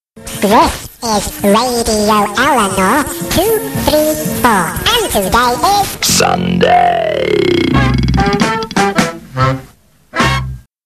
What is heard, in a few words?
Jingles